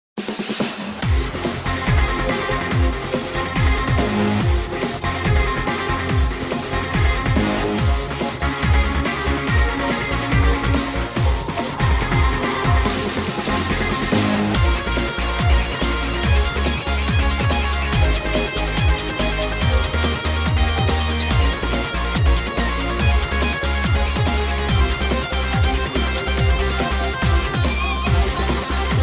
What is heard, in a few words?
victory; mvc3